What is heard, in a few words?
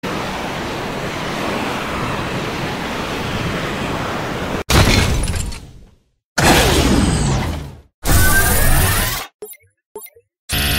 jet pack crash